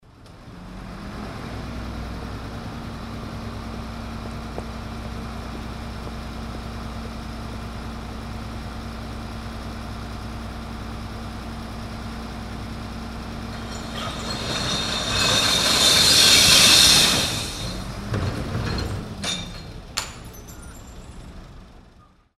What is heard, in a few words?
bottle recycling truck